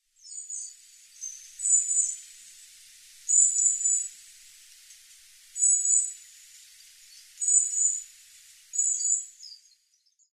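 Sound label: Iriomote Tit, iriomote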